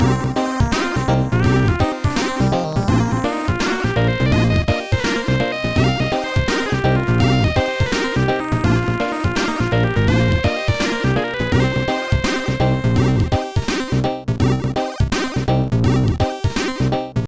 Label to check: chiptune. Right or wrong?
Right